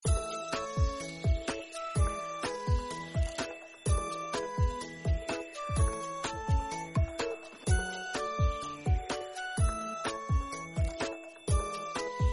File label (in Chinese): 砸金蛋背景音乐